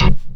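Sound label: chord short, Stabs; E Lab; XTc Files of House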